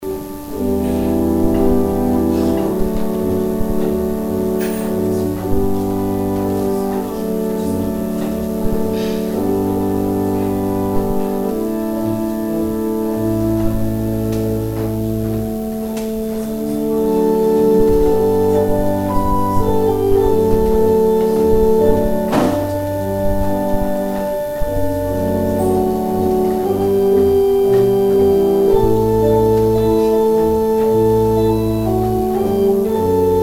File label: organ interlude